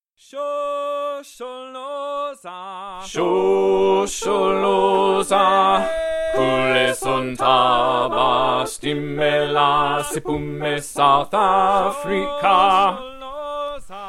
Shosholoza bass

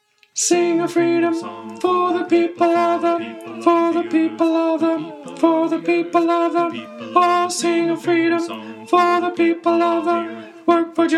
Sing a Freedom Song Tenor

